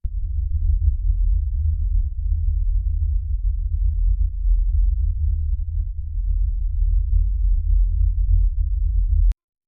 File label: analog, dissonant